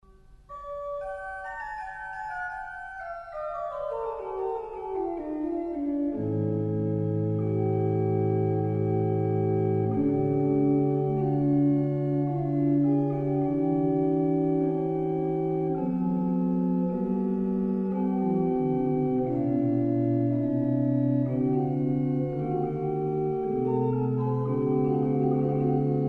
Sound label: toccata d major adagio; bach